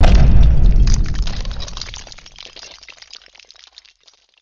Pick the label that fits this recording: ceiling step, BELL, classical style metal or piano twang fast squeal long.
ceiling step